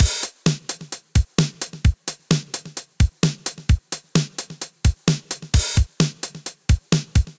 707 Groove, Drum Machines; Ableton

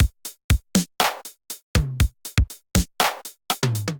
707 Kit, Drum Machines; 707 Cannibals Kit

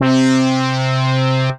Alpha Slo Attack, Synth Keys; Ableton; Creative Extensions; Poli

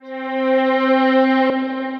Reverse Choir; Voices

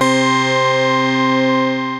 Guitarchestra, synth keys; Instruments; Ableton; Synth Essentials; Tension

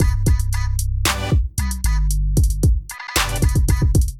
Azimuth Kit groove 114 bpm, Beat Tools; MIDI Clips; Ableton Factory Packs

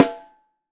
Snare Top

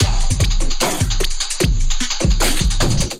Kit groove 150 bpm; MIDI Clips; 133 - 151 bpm; Flared Out Kit; Ableton; Glitch and Wash

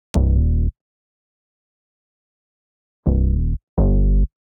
Eerie Bass C Minor 165 bpm; Ableton; Factory Packs; Skitter and Step

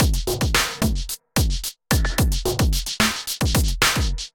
Kit groove 110 bpm, Drum; MIDI Clips; Selector Kit Magma; Ableton; Drum Essentials

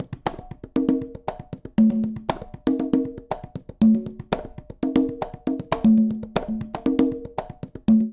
Descarga Conga Tumba 118bpm; Latin Percussion; Ableton; Factory Packs

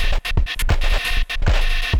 ZigZag Beat; Inspired by Nature by Dillon Bastan; Vector Grain Presets

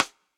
Snare Sidestick; Drum Hits; Cop Style; Session Drums Studio; Standard Hits

